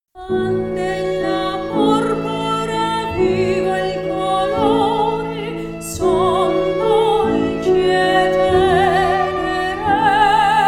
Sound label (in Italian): duetto